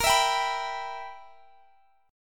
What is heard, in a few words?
B Major 7th Suspended 4th Sharp 5th, guitar chord